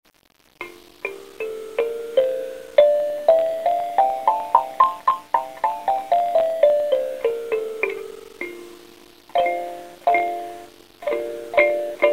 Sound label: kalimba